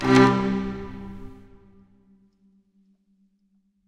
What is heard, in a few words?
music puzzle note up